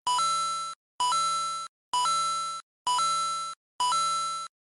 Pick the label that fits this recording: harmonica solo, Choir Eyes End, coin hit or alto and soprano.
coin hit